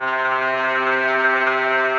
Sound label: Fractured Violin; Instruments; Distressed Strings; Ableton; Mood Reel